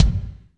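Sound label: bass drum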